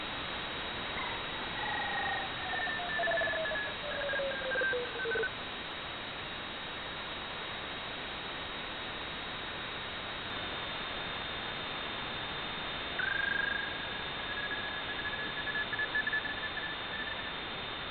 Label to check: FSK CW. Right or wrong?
Right